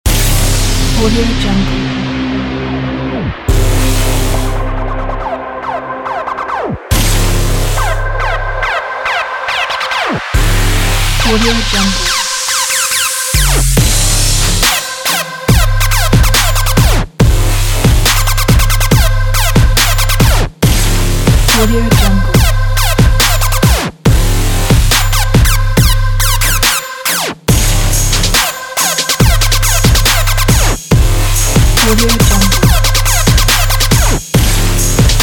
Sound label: strong trap intro; nidred